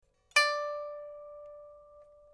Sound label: pipa; chuYinKouXian